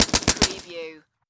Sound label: Submachine Gun Uzi